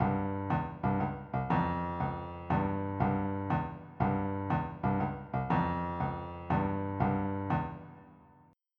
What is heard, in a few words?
motown